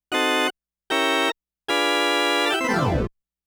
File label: drama Organ